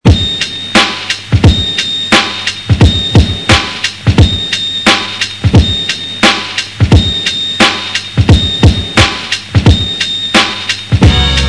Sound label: The Trumpets